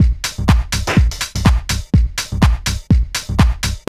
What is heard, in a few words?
house loop